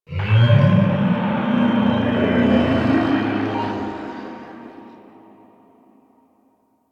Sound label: Distant Infested Low; Ambience; Warframe; NineteenNinetyNine